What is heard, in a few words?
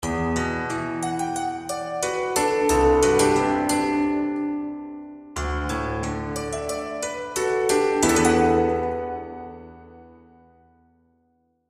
piano